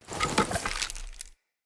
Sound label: UI Faction Equip Spirit